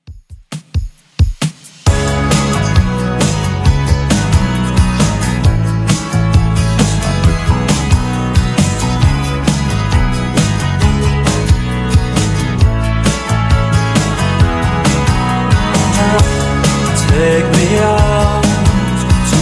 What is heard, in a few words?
80s Rock 134 bpm; Redrum